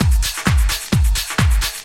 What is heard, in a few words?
drum 130 groove; Techno Trance Essentials